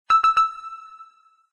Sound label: Warning